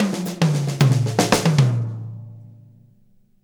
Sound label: Fills, Monster Beats; Zero-G